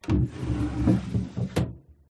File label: Open Drawer, Old, Wooden, Movement, Drag; PMSFX